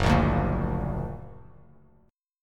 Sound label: Gb Augmented, Bass Standard; Gb+ strummed